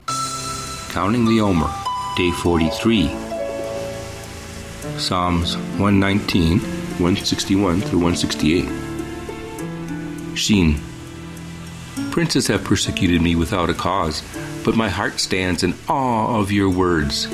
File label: Meditative w Waves Ocean; Omer; Psa119